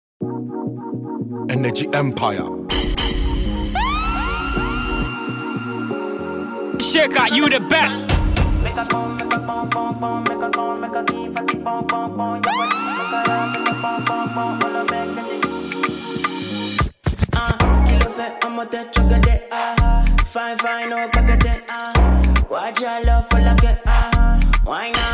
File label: Amapiano x Whistle